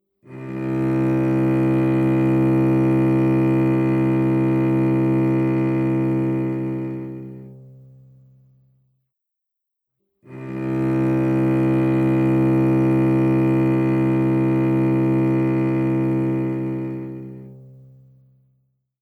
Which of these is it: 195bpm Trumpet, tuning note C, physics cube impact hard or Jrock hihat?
tuning note C